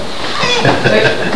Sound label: baby cry